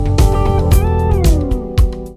Guitar

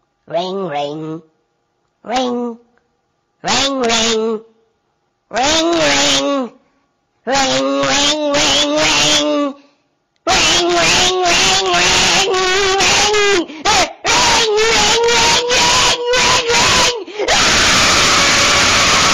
Ring Ring Scream

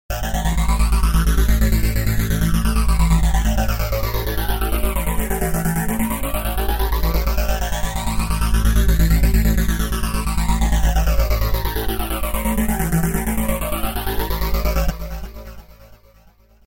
Sytrus Flanger

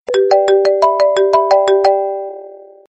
phone sms ringtone; ringtones

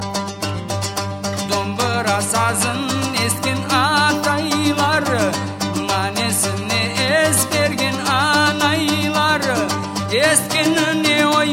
Dombira

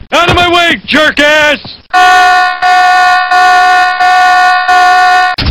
alarm; alternative

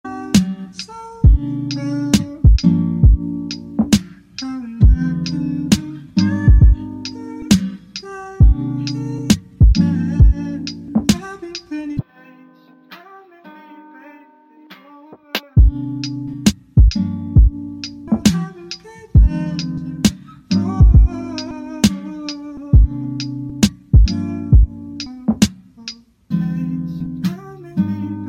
Ska

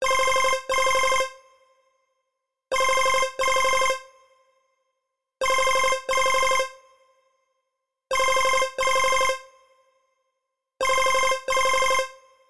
iphone4 triple buzzer; ringtones; sms

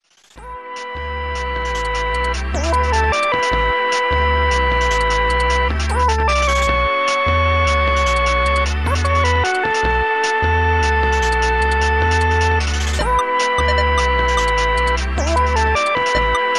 DnB

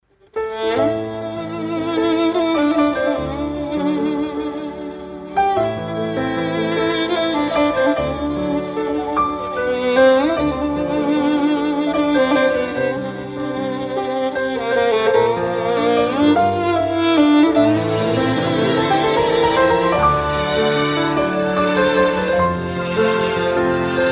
sad classical tone

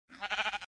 Sheep; Minecraft

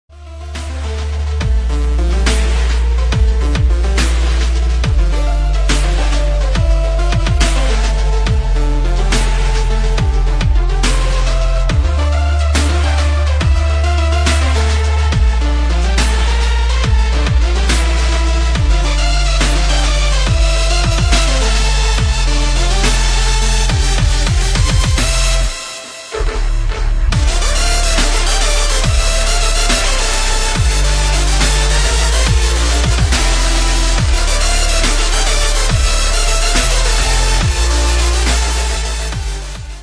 dubstep remix

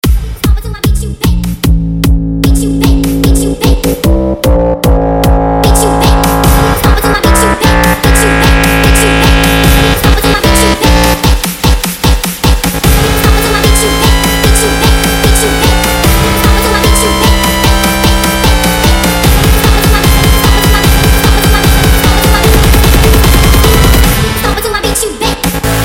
DUBSTEP

